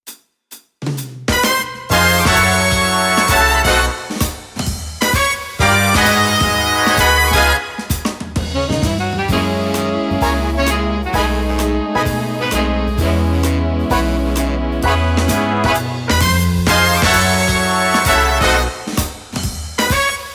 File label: big band